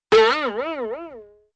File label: springy spring